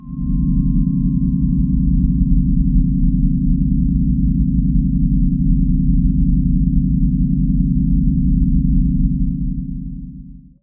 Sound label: PAD Xtreme Low Drone; Vincent Price